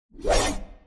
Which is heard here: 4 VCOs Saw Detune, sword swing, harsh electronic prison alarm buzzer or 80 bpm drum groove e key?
sword swing